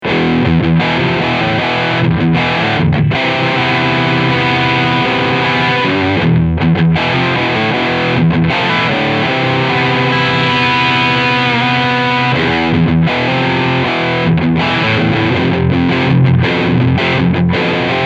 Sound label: Riff Overdrive